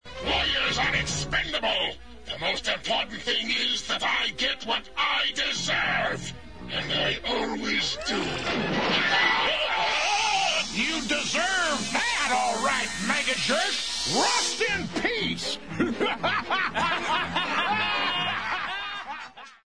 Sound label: groan; transformers usa